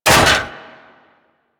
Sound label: Radar Ship Metal Grate Release; Warframe; Ambience; Grineer Railjack POIs